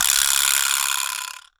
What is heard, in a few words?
VIBRA SLAP, CUBA